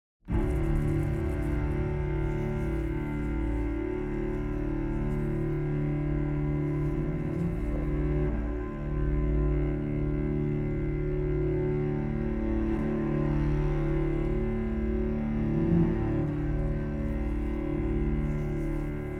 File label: The Winding Path Of Adventure Underscore, Classical Cinematic